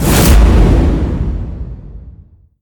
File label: whoosh